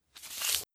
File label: Objective On